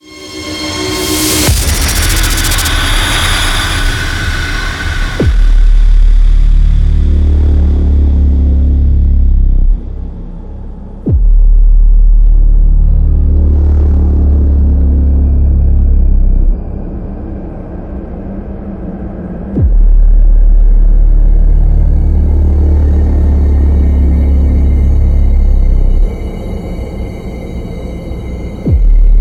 Flesh & Metal ending; Doom

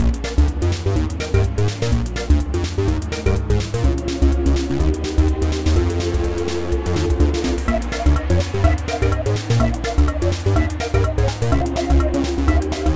Space 2 (Fast); Pocoyo Racing; wii

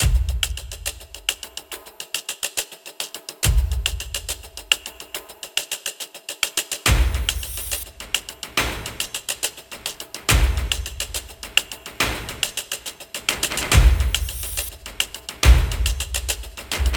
Percussion Loop; Dual Blade: Battle of the Female Ninja

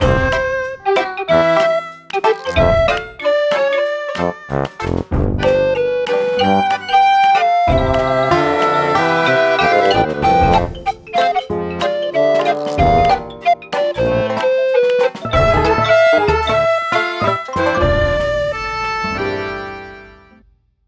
Beats; Sparklite

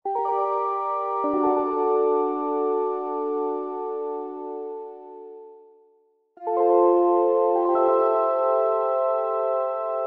Chord; quarantine circular macos switch windows gamerip 2018

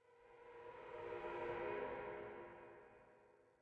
strange echo; pirate mysteries android ios macos mobile windows gamerip 2012

